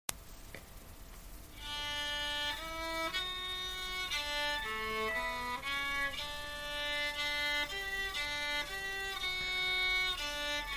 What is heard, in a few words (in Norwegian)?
Smed-Jens 2 stemme